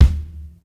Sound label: Kick, Drum Hits